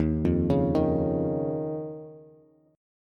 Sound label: Eb 7th Suspended 2nd Eb, Bass; 11,8,11,8; Eb7sus2 arpeggiated; JGuitar